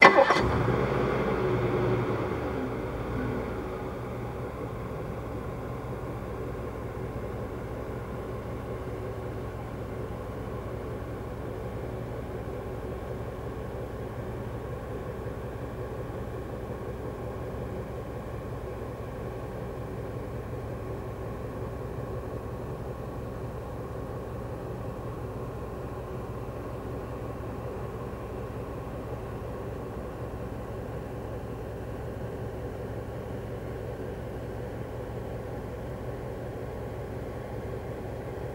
Cadillac 1990 Exterior Start, Idle, Shut Off From Distance